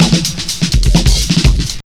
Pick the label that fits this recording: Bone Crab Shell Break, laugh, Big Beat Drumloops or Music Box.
Big Beat Drumloops